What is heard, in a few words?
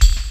WAGON PC, Industrial; USB Soundscan vol.24; AKAI